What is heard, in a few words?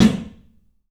CLUB SNARE; 3D Sound; 3D Drums